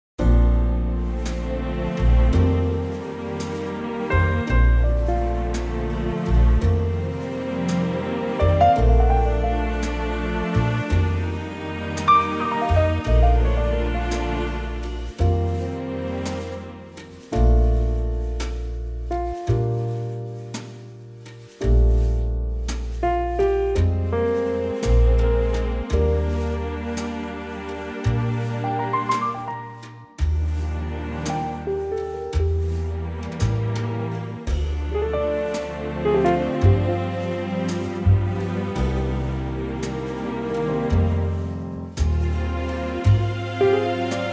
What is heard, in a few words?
Bb to B orchestral